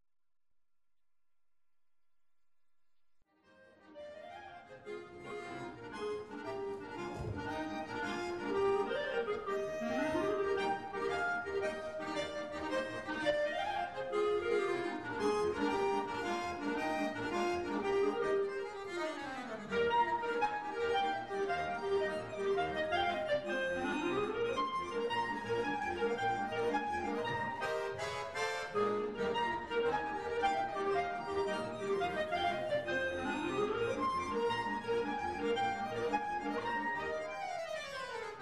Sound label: Marche Hora